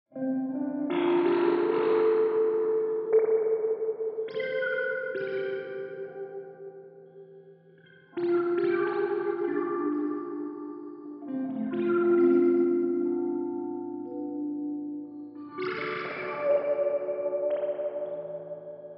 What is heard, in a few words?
Guitar Long Decay 100 Mix Coupling On; U-He Twangstroem